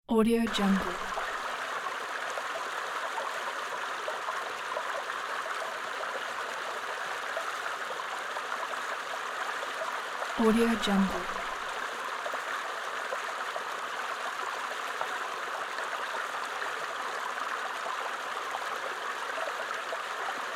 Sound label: creek in mountain